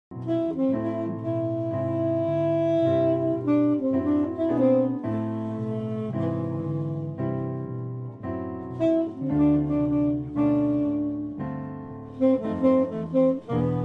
Jazz Duo

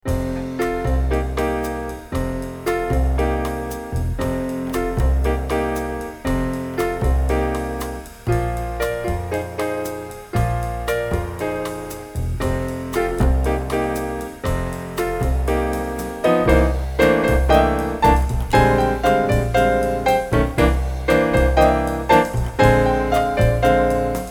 One Man Band, Piano

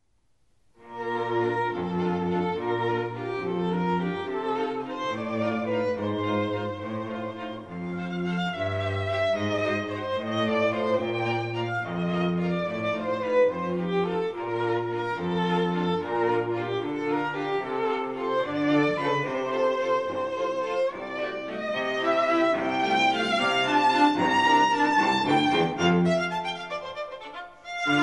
Waltz, Strings

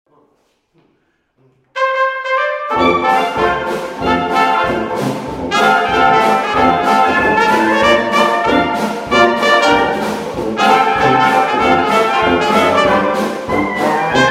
Canal Street Blues, jazz

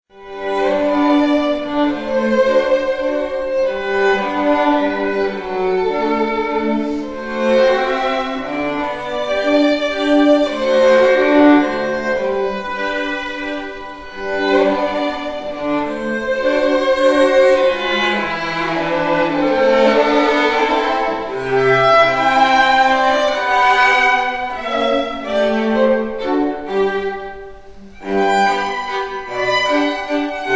Waltz, classical string quartet; Emperor Waltz Strauss; Montani Music Ensemble

